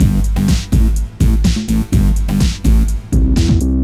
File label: loop